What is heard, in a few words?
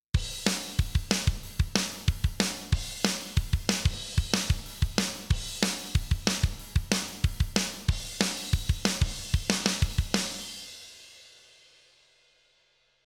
drum On